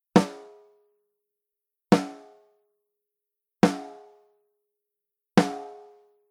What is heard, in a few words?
high-z, snare drum; Focusrite828; preamps